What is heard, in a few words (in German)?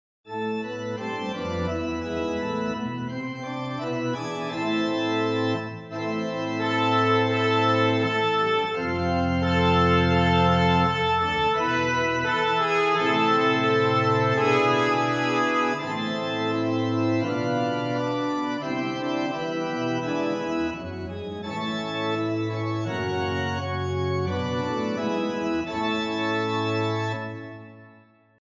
Jingle; Schoepfungstag; Eberswalde 2024